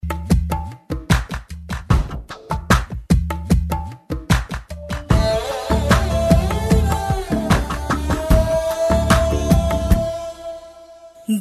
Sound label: Dhol Mix